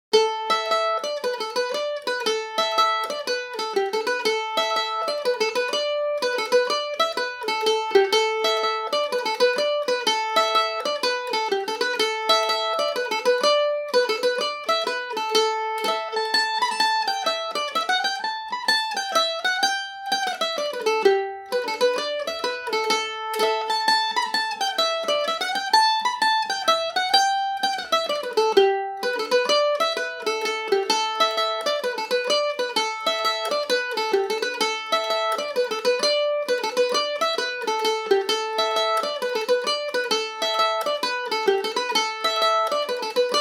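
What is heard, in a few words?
slide, A dorian, irish mandolin; O’Keeffe’s Slide; G&O #34 mandolin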